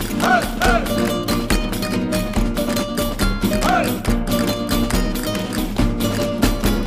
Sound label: music world music shooter swarm